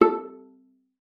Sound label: A4, VIOLA PIZZ; Xsample VOL-5; 90 s Sample CDs